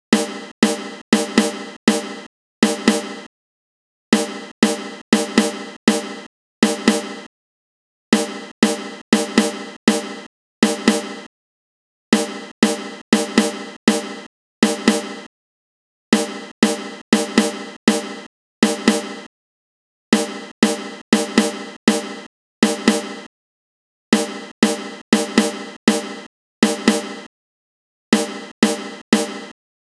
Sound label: snare; hydrogen